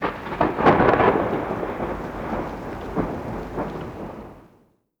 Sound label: Thunder Clap